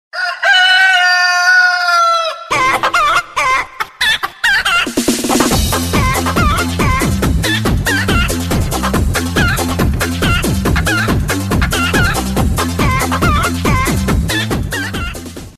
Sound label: crazy rooster ringtone; android